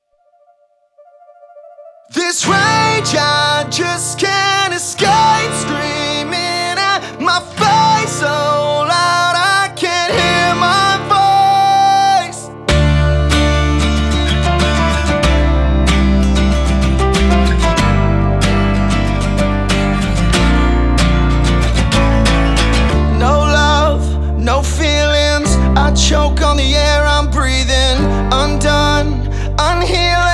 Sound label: Acoustic